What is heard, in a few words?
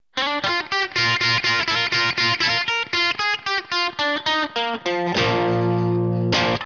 amp jcm2000